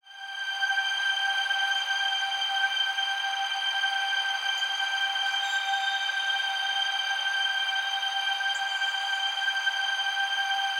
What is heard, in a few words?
Ambience - G; Cymatics - Odyssey